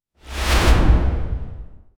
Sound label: a three step cinematic, build up